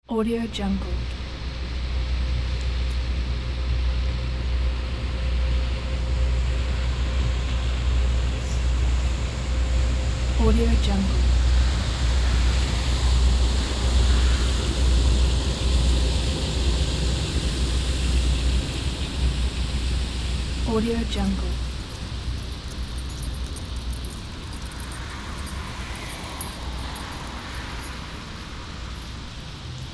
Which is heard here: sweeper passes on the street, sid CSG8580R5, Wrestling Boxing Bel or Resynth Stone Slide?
sweeper passes on the street